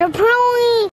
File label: kid loud